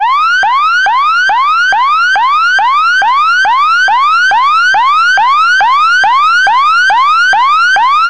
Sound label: police yelp siren